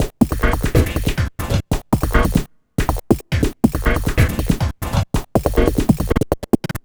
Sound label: Electronic Percussion